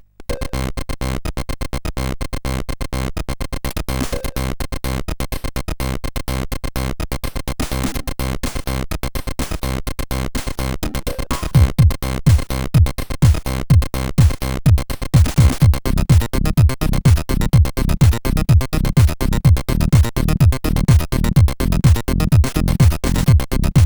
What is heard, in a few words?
Light, CSG8580